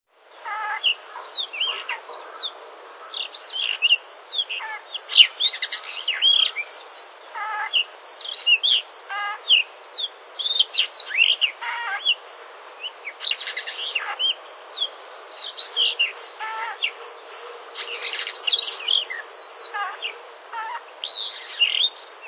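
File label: Blue Rock Thrush, young male; itoman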